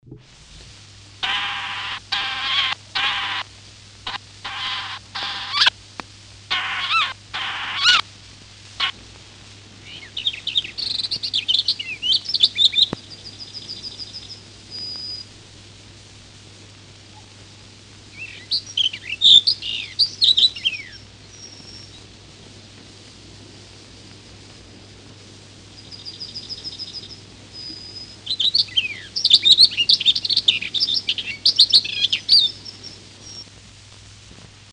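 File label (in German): Sperbergrasmücke; Vogel; hoerstation vogel wg platte 2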